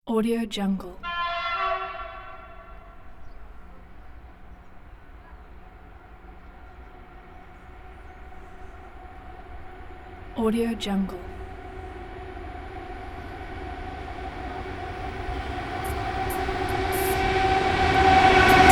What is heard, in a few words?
cargo train passing close